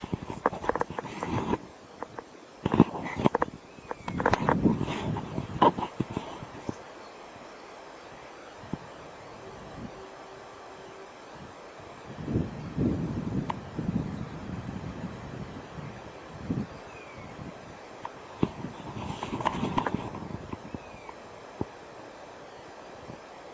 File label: northumberland juv depart